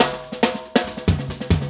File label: LOOP SD, Drum & Bass, 140 BPM; Ueberschall; Drum & Bass Flash Trackz